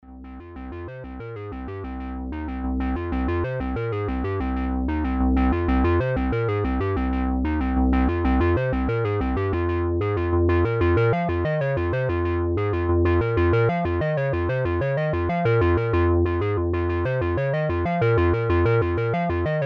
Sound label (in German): Panning vom Sequenzer